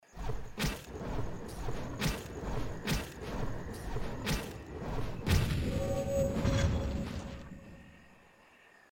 burned death; hyper light drifter

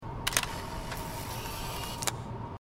noise kamera; life is strange

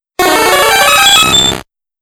mewtwo cry first gen; Pokemon Red Blue; gamesoundopedia

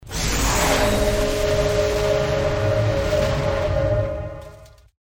spell strenghten effect; baldursgate

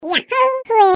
rock throw; pikmin 3

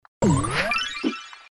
pickup; Subway Surfers

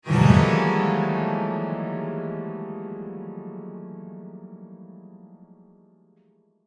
player detected piano flail; dishonored

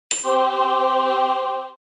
objective completed

